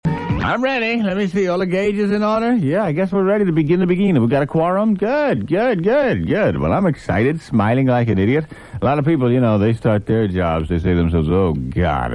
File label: Tap dance